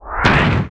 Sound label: attack